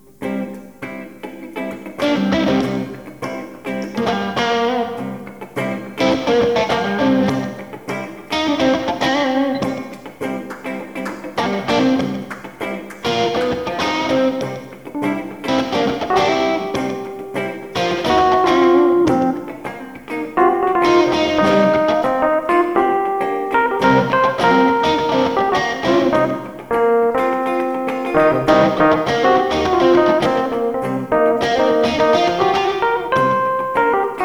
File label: Grilling Jammin Funky